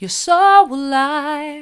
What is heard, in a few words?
mid, vocal